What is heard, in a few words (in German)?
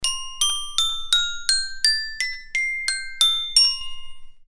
Sontronics STC 2 Niere flat Xylophon